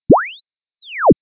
Linear Sweep 0 5500hz

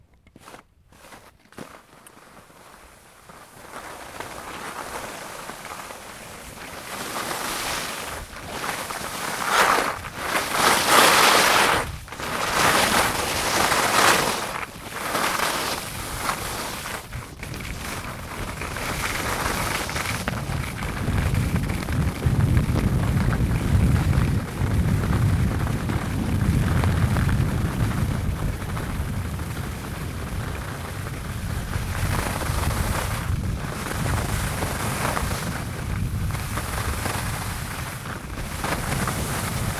Downhill Ski

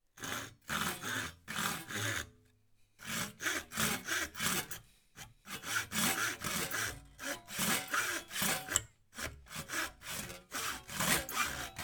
Sawing Wood

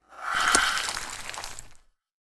Ice Magic Arrow

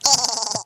Minion Laugh

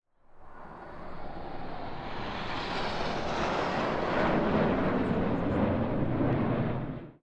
Flyby

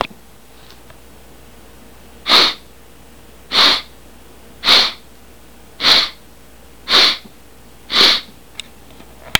Snort

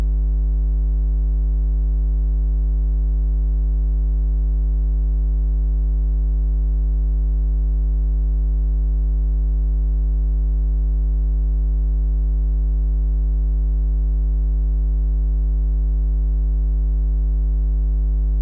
50hz Light Buzz

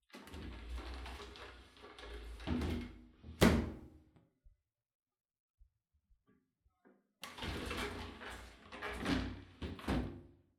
Opening And Closing A Shower Door

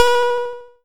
Select Denied

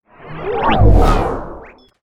Swoosh

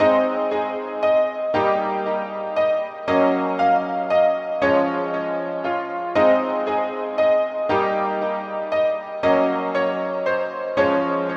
Gentle Piano Music Loop

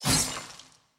Hit Swing Sword

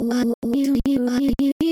Chopped Vocal Loop

